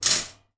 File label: foley shopping basket metal lift out from another; zapsplat